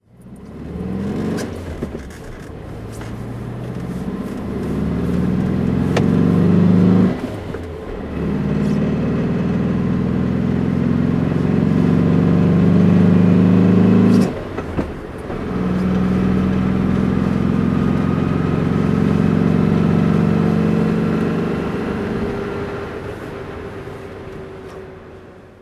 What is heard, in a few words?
porsche 912 white driving